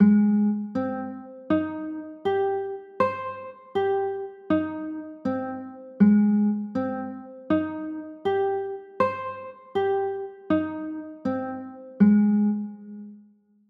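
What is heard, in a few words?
G sharp maj7 chord, guitar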